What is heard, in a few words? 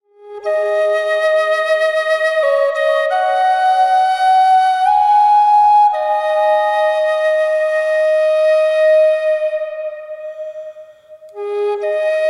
Song Key G sample